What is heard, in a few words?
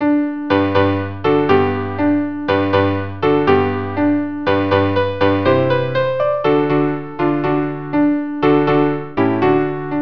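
violin piano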